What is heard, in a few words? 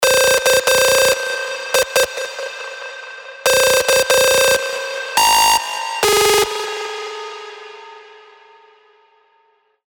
Screech Crusha; revealed spire mainstage drops